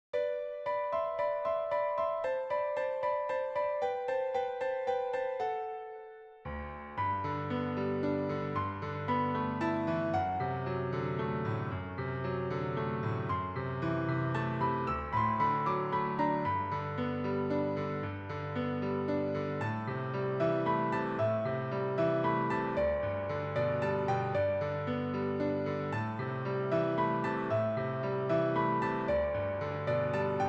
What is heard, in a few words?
arietta in g major